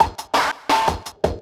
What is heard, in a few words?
loop, 170 BPM, drum & bass